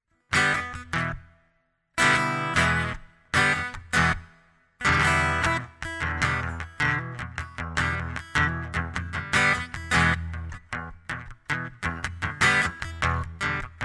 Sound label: acoustic; Freedom Again